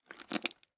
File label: Liquid Mud Gurgle